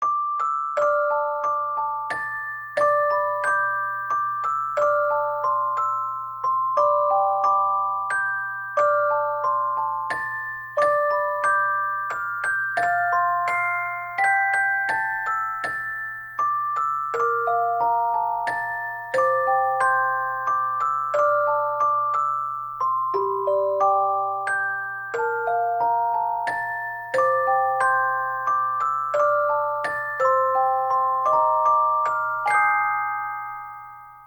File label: Fm Orgel; Ar nosurge: Ode to an Unborn Star DX